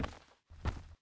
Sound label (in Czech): pult; Mafie 1